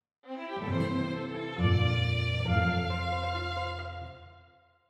Pig Race Third Place

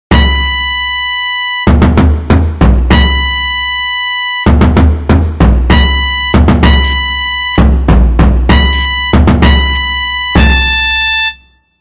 Fluite Drum

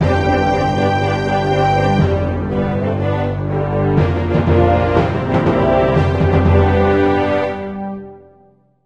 Win Fanfare; Sex Simulator 2020

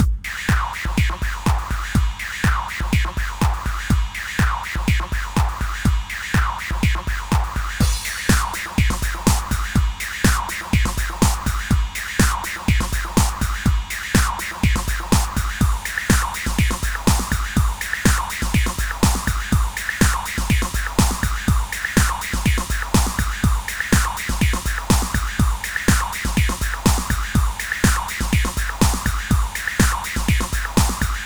Truck Drums Dance Loop; Space Ribbon

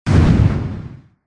Enemy Shaman Summon

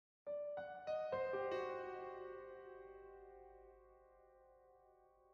piano C minor sharp 11 cue

